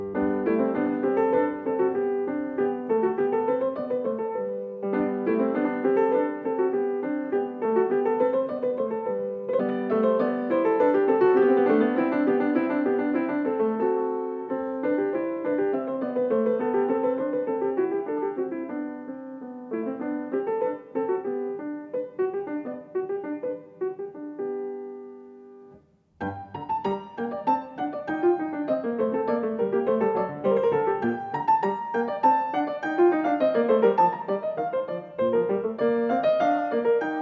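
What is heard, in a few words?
Gavotte, piano; English Suite No. 3 in G minor; BWV 808; Gavotte II - Gavotte I da Capo; BACH; ENGLISH SUITES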